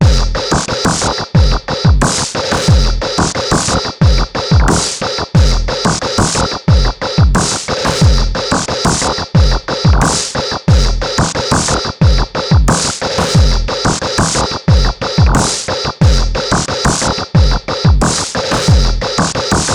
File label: Yamaha Motif XF7 LOOPS Loop